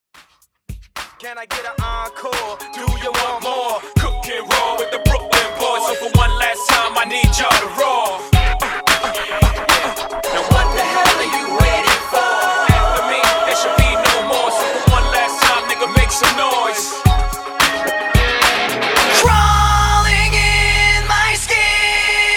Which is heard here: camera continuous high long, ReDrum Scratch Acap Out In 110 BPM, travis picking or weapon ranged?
ReDrum Scratch Acap Out In 110 BPM